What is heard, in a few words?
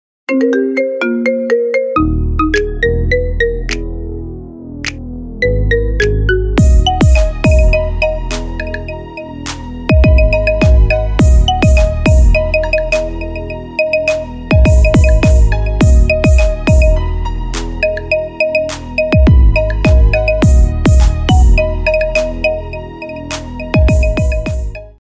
Marimba